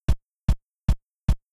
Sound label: DPCM Kick, chiptune